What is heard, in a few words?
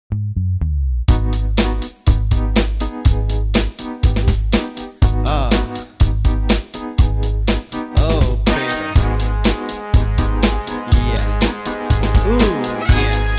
pop